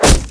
impact; dota; enchantress

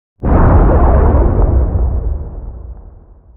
tower distant; building; damage; radiant

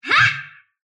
sylph attack short; dota; dark willow

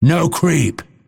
deny pain; dota; phantom assassin

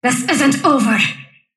sylph death; dota; dark willow

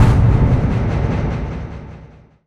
telekinesis stun; dota; rubick

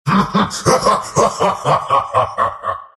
laugh; dota; visage

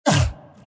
abys pain; abyssal underlord

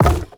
wood drop; ui; inventory

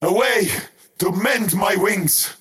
crown teleport pain; skywrath mage

